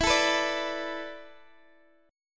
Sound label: E 7th Suspended 4th, Guitar, Open G